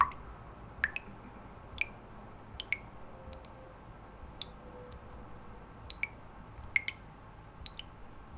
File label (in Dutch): druppels met bgnoise